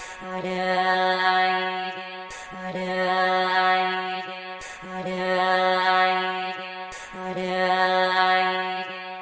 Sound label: breathy vox, synth and electronic loops; melodic loops; superheart